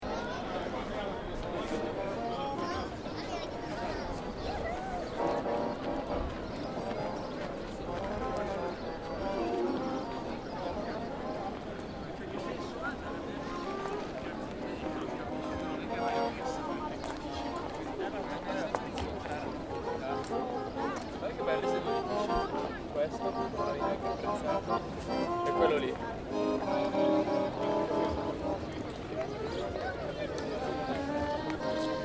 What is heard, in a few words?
European City Plaza Loop, Open area with people talking; and enjoying themselves and a person playing accordeon at street corner; City Sound Effects